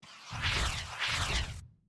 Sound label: eagle boss Rocket Fall